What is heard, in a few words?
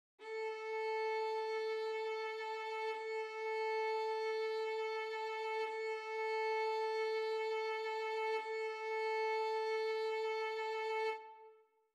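Tuning note A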